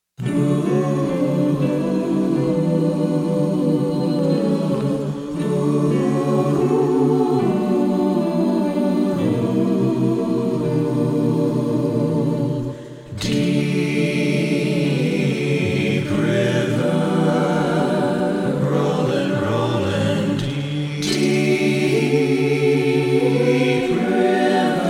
SATB; Deep River

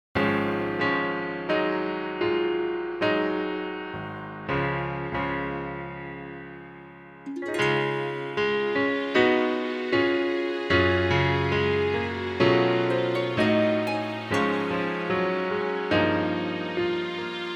accomp track Ode To Joy orch

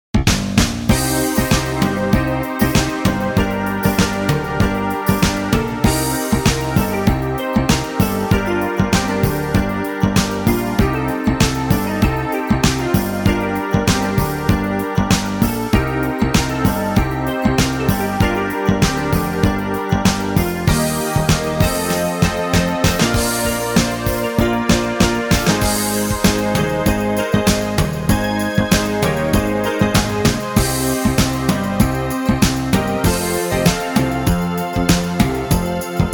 accomp track

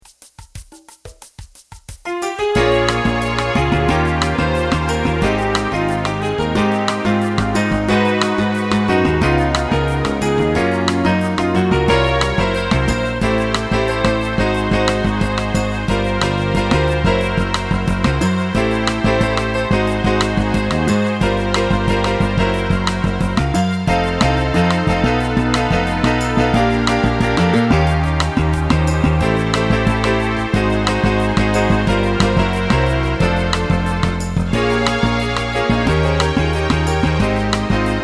accomp track in F

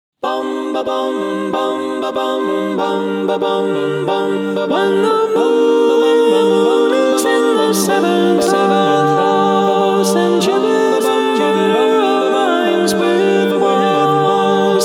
acappella

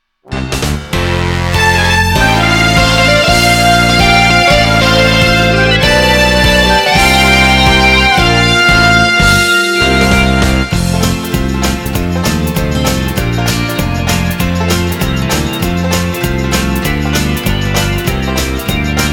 accomp track

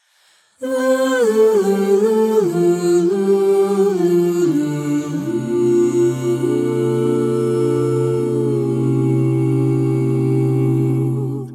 SATB acappella

